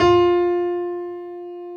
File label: PF BDF F 1, Piano; AKAI S6000 CD-ROM - Volume 1; STEREO G-PF 2